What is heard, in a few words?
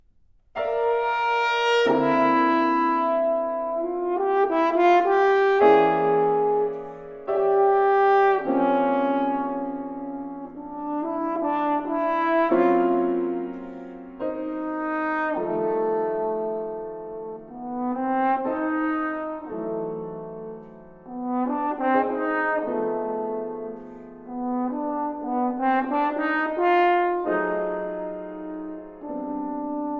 Twilight Music For Horn, Violin and Piano, Adagio cantabile